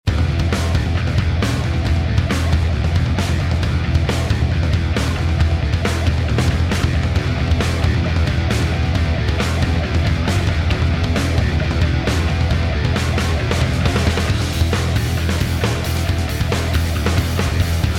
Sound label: Instrumental; PM USA